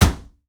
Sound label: Medicine Cabinet Door Close; Doors & Drawers